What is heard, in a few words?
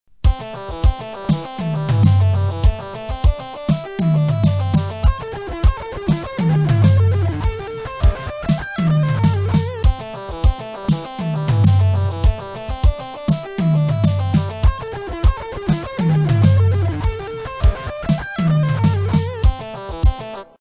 16th notes, straight 4/4 time, 100 bpm, guitar; live guitar version